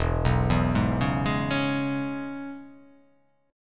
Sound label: E 6th, down